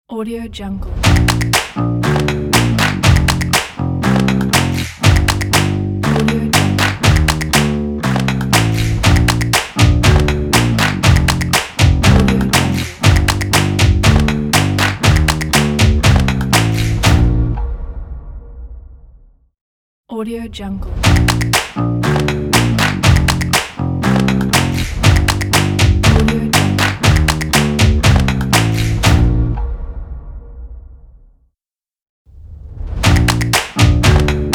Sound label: guitar claps logo